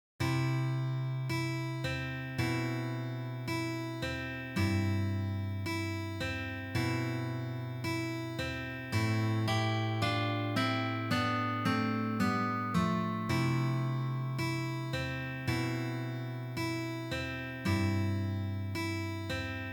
Fingerpicking Harmony

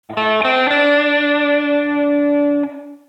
Lick

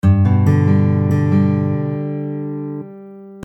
Movable Chords G; Fingerstyle Fun

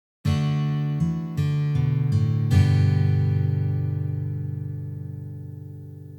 Bass Run

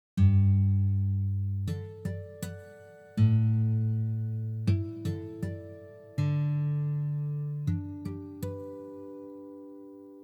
Harmonics

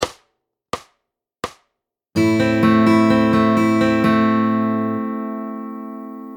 Fingerstyle Spanish Style

